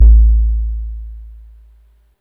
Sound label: One Shot Bb, Synth Bass; Basses; Synth Bass One Shots; D.B. One Shot A (standard 1)